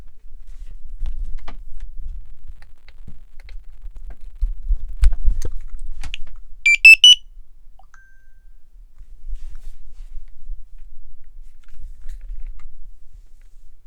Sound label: Barcode Scanner Beeps